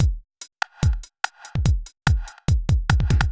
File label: fast break 145